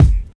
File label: dope bass drum one shot f sharp key; Bitdepth 24